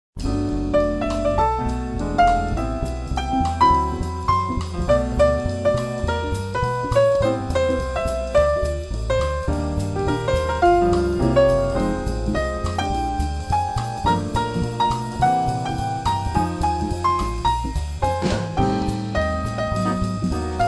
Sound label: light jazz